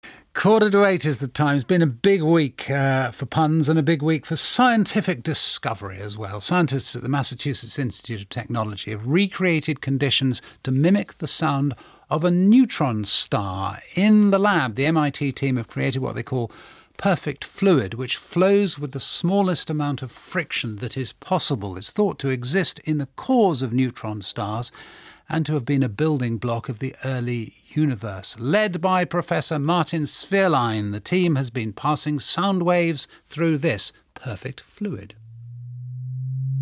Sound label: star noise; MIT; BBC R4